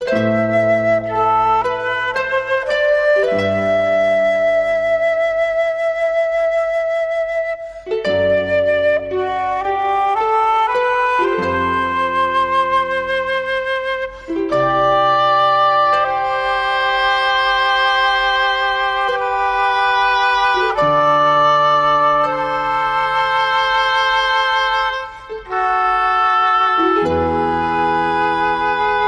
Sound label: Woodwinds & Harp